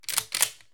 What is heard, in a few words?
shotgun-cycle; SupaLidlGame